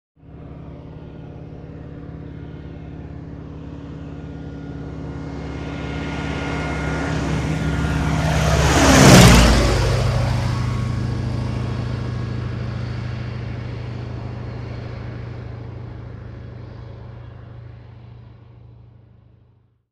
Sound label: Stearman By Low Drone, Stearman Xa-21. Low, Growl